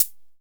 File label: HH, Fricke MFB 501